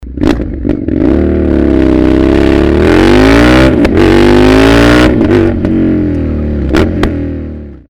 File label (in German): Indian FTR 1200S Racing System ohne DBK; REMUS